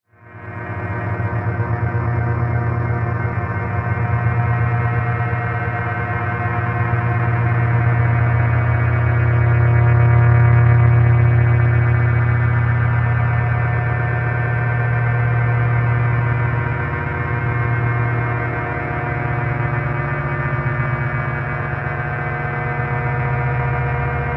Drone Dark Metallic Pulse Delay; Blastwave

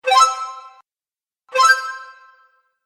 Game Award, video game sound effects

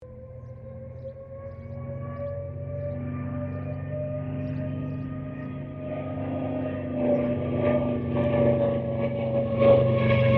AIRCRAFT CA-13 BOOMERANG PASS STEREO; Airplanes (Sound Effects:Vehicles)

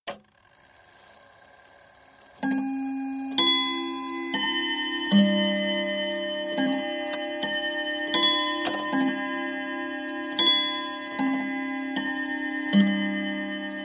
Domestic chiming clock striking 12 o'clock; Chime; BBC

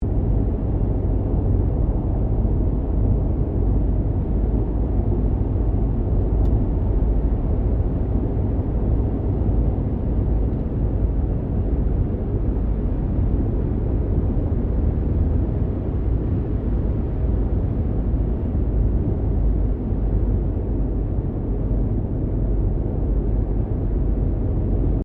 CAR SUBARU LIBERTY INTERIOR DRIVING LOOP; Car Subaru Liberty 20 01; Family members Subaru; Cars (Sound Effects:Vehicles)

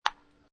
Ping Pong Table Tennis Bat Hit Ball; Sports

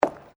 FOOTSTEPS WOMENS LOW HEELS BOARDWALK STEP; Footstep Foley; Footsteps (Sound Effects:Human)

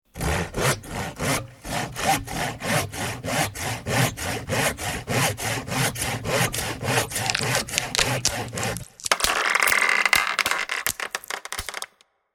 Sawing a tree which falls; Felling A Tree; Hand Tools

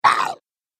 Zombie - Ow; Zomby; horror

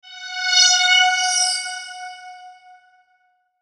Logo Tone Bright Whoosh; Sonic Logo; Blastwave

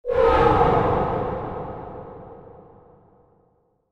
Ultimate Sweep Logo Transition, whoosh; Whoosh Sound Effects

